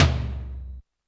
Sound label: Yamaha RM 50 Toms